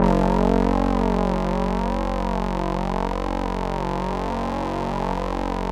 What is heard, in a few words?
LAMBDA, String Machines